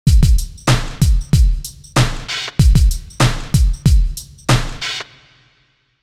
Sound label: S21 Warm Hall